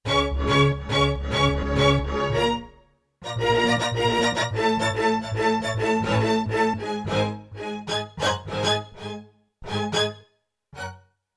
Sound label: Mix Hit Strings, Orchestra Hit; Orchestra Hit 2